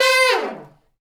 BRS, Fat Falls; Roland L-CD702; Fat Section

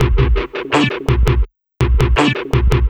Thunderlings, Synchro Funk, Beats; Sonic Foundry (Sony Creative Software)

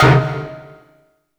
STAB Black Ice Chord; Polyend; Plughugger

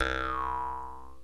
JEW'S HARP

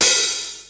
Hat Open, Cymbals; Akai XR-10

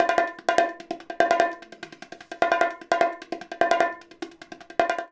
BONG, BONGO 100, Modern Percussion Loops; Soundscan vol.56; AKAI

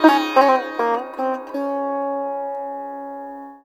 SITAR; Spectrasonics; Heart of Asia

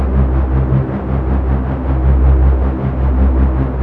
SHIP IDLE, SPACE

